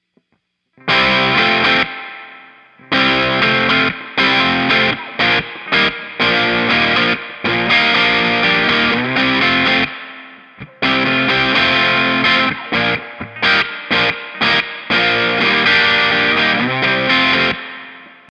Rocks

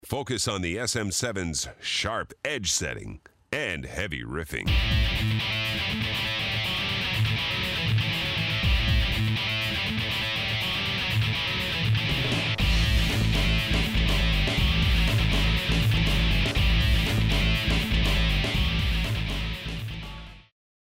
Ultra Heavy Riff

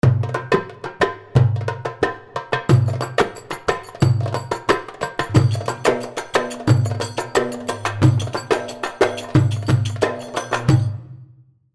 Wahda 090, darbuka

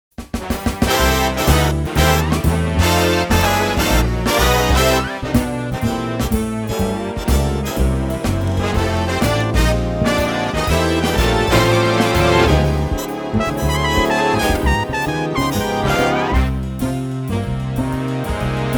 big band

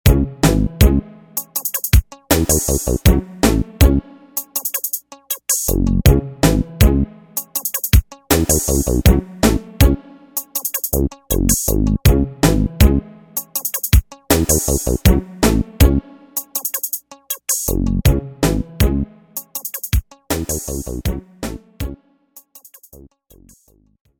EMX Hip Hop

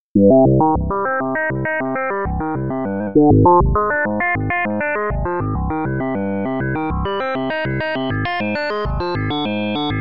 Velo FM Soft Bass

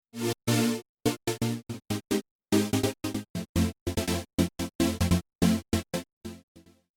4 Bar Stutter Cut

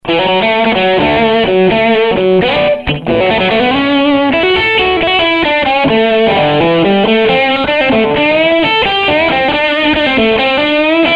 Classic Marshall JTM-50

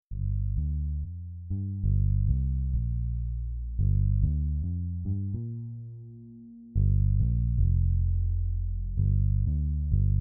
J Bass

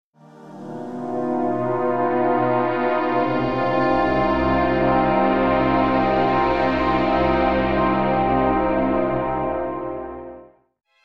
Symphonic

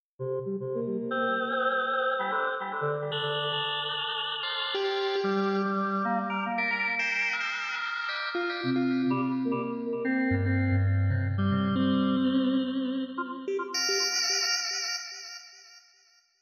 boxy gone foxy fx

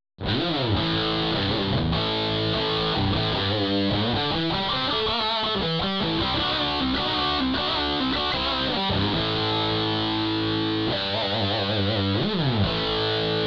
rock

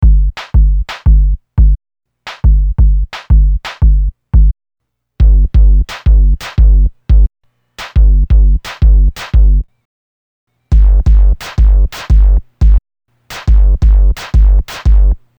Groove Machine

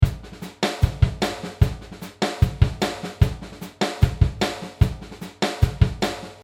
Rhythm n Blues 75

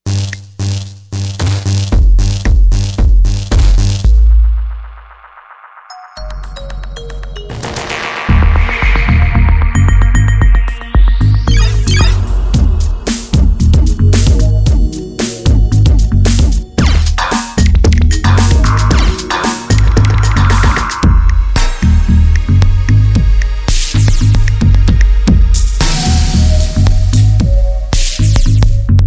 future kit

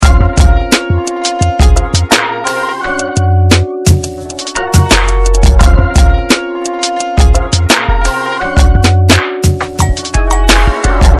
86 bpm

